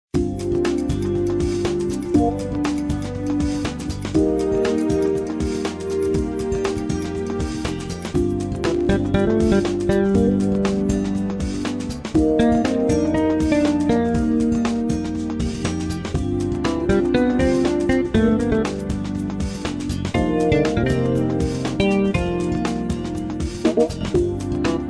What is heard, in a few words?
light jazz